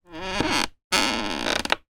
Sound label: office chair creak; zapsplat